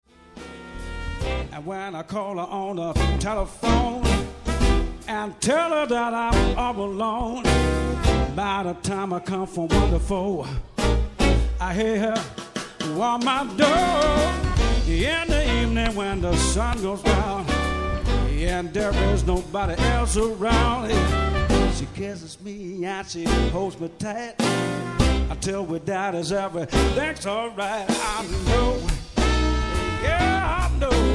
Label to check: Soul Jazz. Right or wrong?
Right